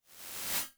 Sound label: Reverse Nonlinear, Experimental; Ableton; Convolution Reverb